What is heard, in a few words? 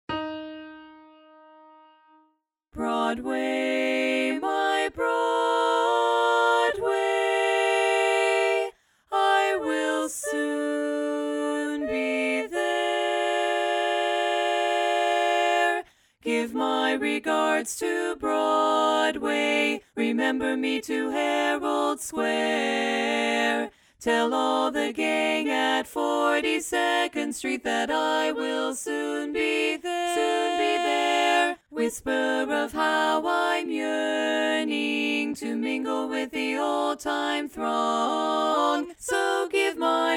Bass Missing